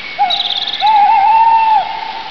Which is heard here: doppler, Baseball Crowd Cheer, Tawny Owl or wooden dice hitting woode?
Tawny Owl